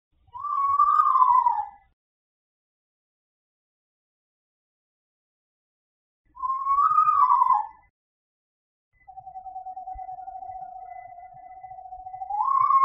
EASO whinny

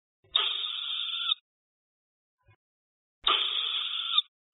nestling, owl

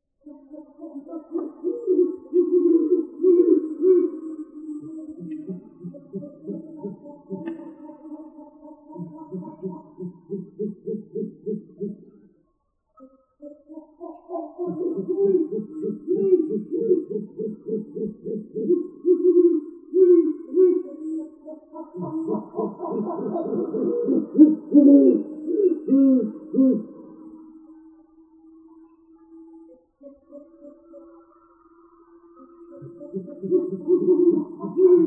males in spring, owl

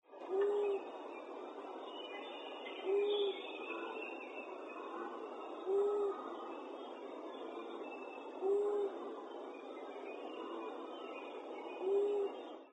LEOW male advertisement

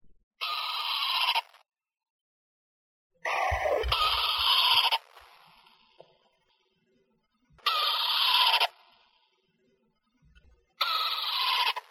juv food cries

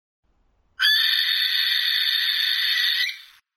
scream, owl

